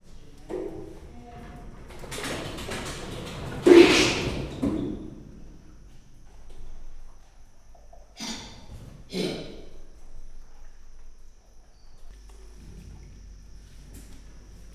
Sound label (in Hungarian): egy kis hangoskodas fele, huszarmajom; Győri Zoo 2014